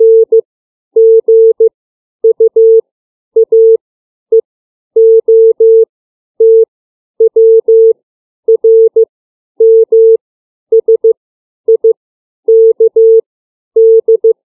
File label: CW alphabet